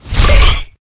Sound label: Weapons Shock Rifle